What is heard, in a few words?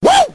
"woo"